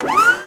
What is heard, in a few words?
Servo High Start